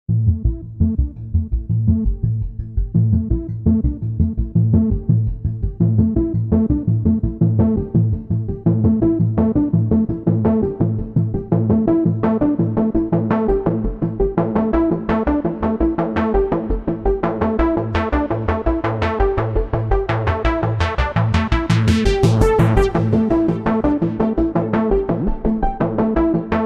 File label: vsti sounds, external fx; deepsonic microdeep one